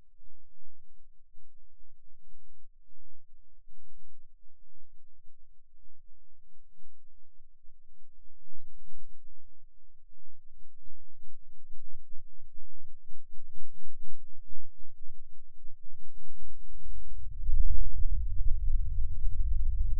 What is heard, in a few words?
Rhythm Seizure, eeg